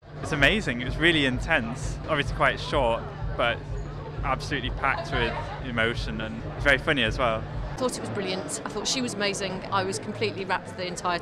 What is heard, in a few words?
audience reaction; kafka s monkey